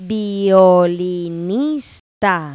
violinis; Pipo-Aprende musica con Pipo

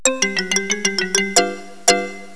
xilofon; Pipo-Aprende musica con Pipo

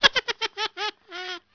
risa; efectos

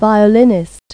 violinis; Pipo-Aprende musica con Pipo

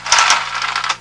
cortina, efecto; Pipo-Aprende musica con Pipo

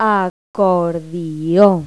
acordeón; Pipo-Aprende musica con Pipo

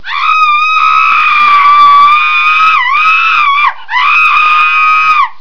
GRITA; El Juego De La Oca Loca

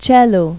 VIOLONCH; Pipo-Aprende musica con Pipo

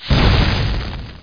Bola Lava; Grounch

